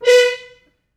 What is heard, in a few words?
Horns stops